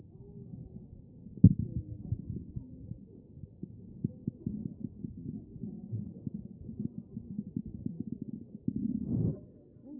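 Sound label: anterior chest; B MODE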